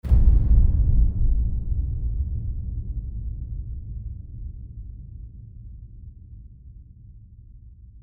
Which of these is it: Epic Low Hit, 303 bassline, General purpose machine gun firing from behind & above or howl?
Epic Low Hit